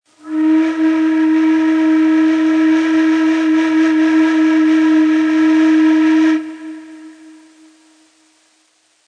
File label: Pes Saba, Ney